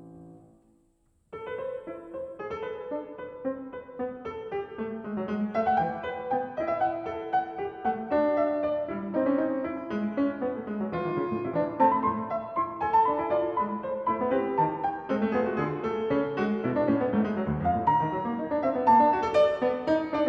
Fugue in A minor, Piano

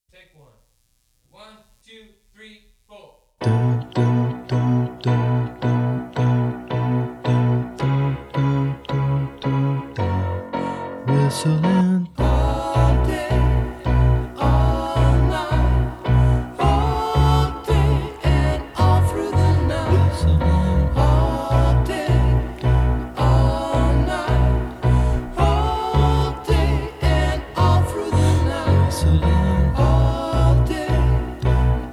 Whistle In Track and Background Vocals

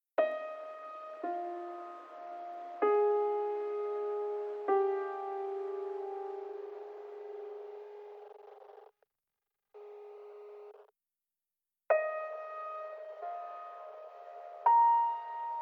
Harmony 2 (Piano)

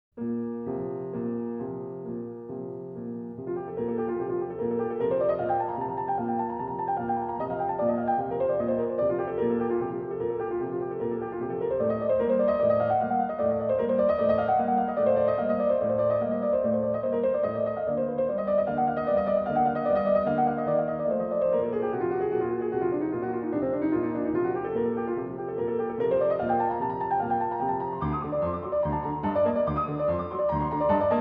Fugue in G minor, Piano

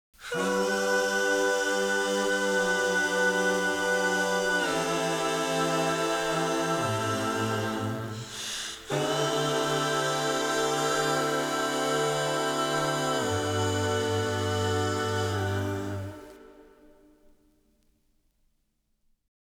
(backing vocals excerpt)

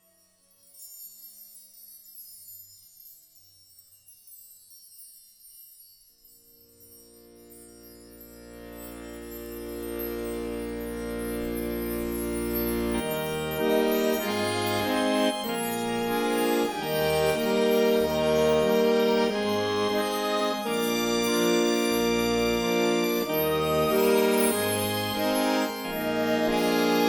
Harmony (Accordion)

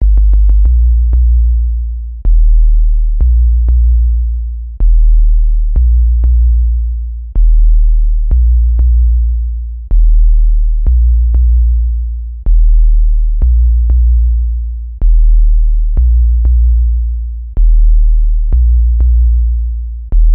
Bass Sequence

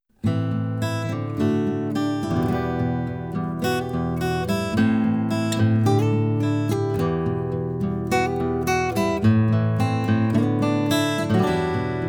Guitar Intro

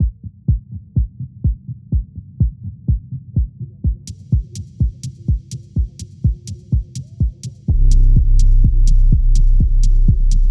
Focus Soundscape; Endel

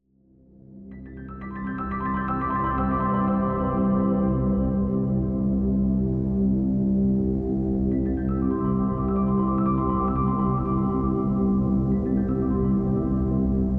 Soundscape

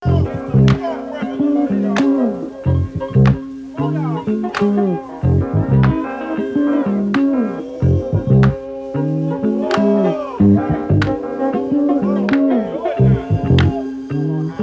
African Rhythms (no drums)

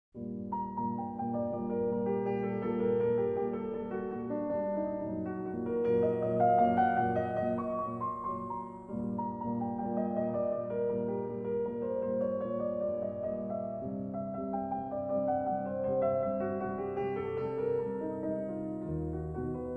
Fugue in F major, Piano

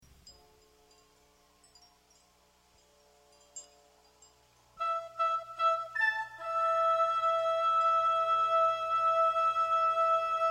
Fanfare

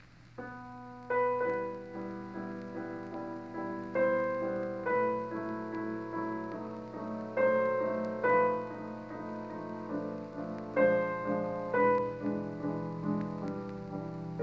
Prelude in E minor; Frédéric Chopin

